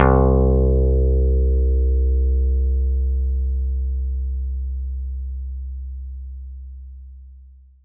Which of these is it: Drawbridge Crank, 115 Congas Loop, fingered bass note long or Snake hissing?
fingered bass note long